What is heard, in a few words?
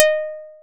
plucked, programmable speaker